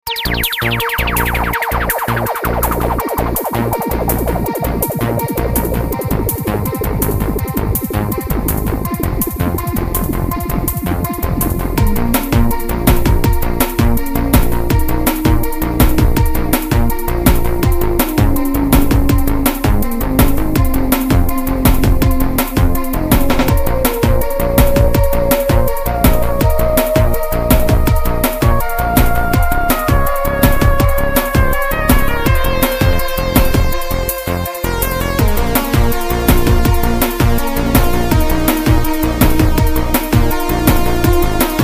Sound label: Menu